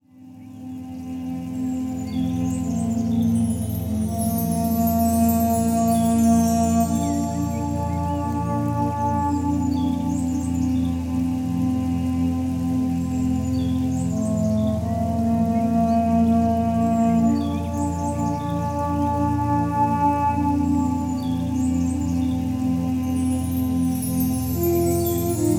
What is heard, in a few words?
Instrumental snippet